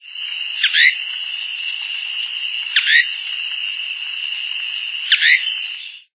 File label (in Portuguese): cabeca-de-prata